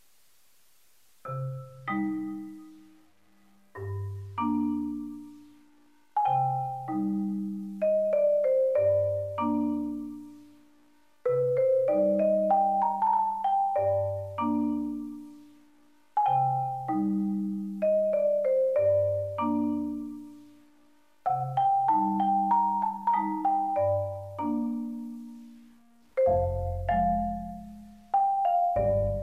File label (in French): thème percussions et orchestre